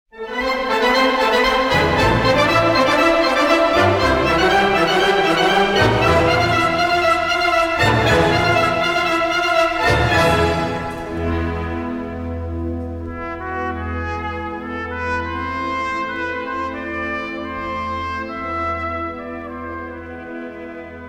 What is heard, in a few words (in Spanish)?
Carceleras para Trompeta, y banda; LAS HIJAS DEL ZEBEDEO; Fernando Bonete Piqueras